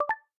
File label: Video Stop; BLU G60